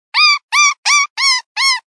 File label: Baby Jack, predator calls